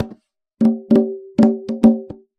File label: Conga Loop (100BPM); musicradar